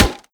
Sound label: wood, bullet impacts; physics; Half Life Model Pack